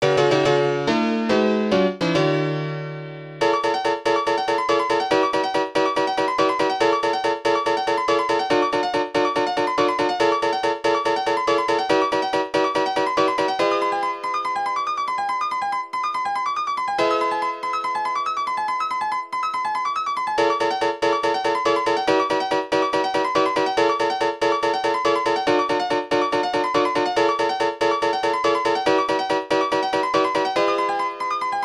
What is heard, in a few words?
Victory Fanfare, midi; Final Miditasy 7